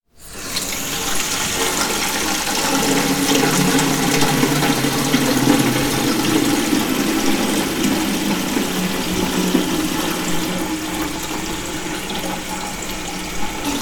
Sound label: Plastic Bucket Filling